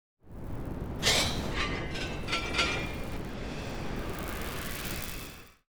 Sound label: crunch zones 3D